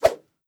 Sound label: Swoosh Swipe Smooth